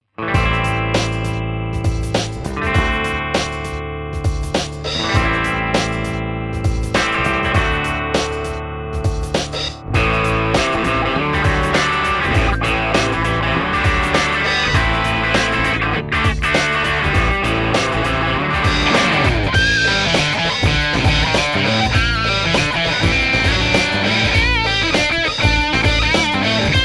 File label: Super 20 Distortion